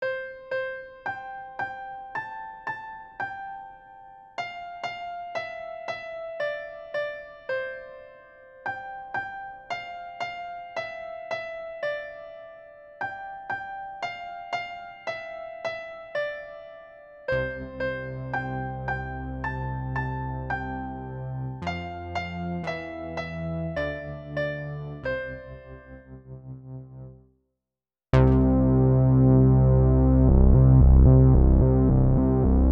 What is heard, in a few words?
twinkle remix